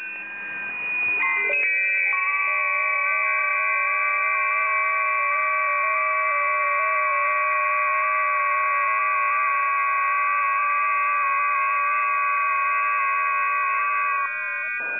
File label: websdr test, ft8; decodes from WSJT-X